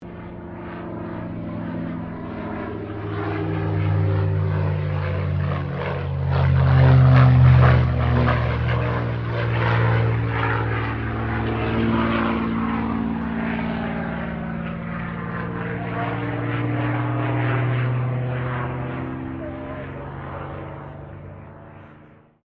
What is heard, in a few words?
AIRCRAFT CAC CA-18 MUSTANG MK23 PASS SLOW; CA-18 /P-51 Mustang; Airplanes (Sound Effects:Vehicles)